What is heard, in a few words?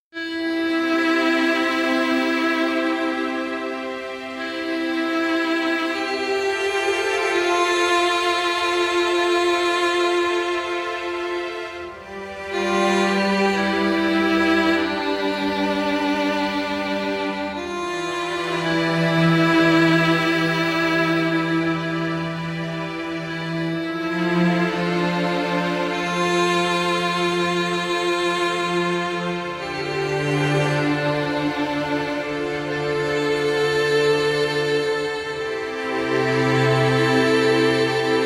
Solemn String; sad